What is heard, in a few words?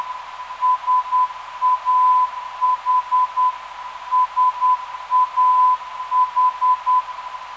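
446kHz, non-directional beacon; SAH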